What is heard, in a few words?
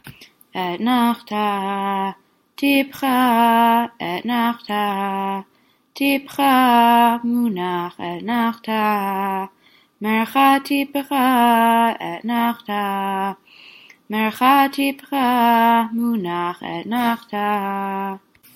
polish lithuanian eicha אתנחתא